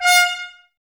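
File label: Brass trumpets F, Mute; Miroslav Vitous - Woodwind Brass Ensemble; Tps Mute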